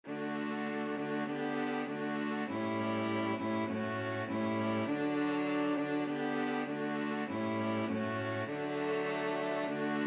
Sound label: string quartet; all to jesus